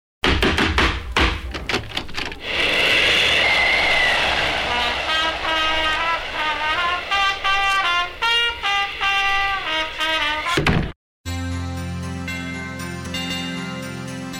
Christmas Jingles

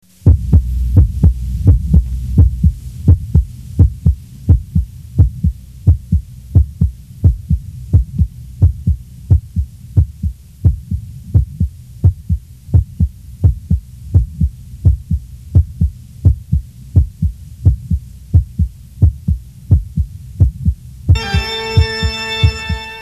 Heartbeats and Organ Reprise; Halloween; Hallmark Halloween 1989

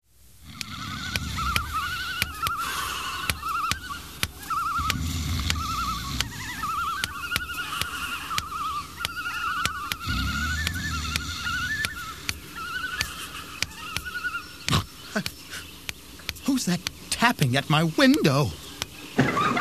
Tapping at My Window; Halloween; Hallmark Halloween 1989

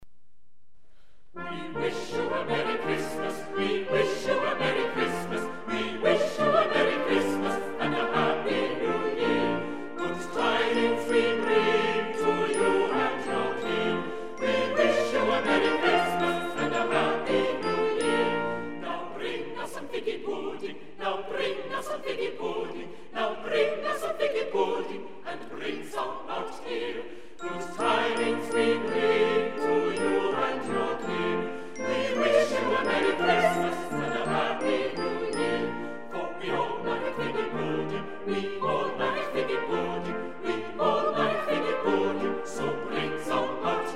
chamber choir - we wish you a merry christmas

